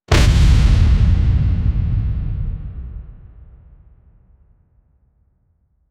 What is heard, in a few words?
viking horn impact